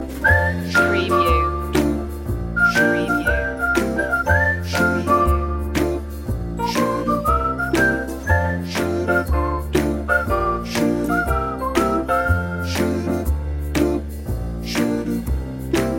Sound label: fresh and fun loop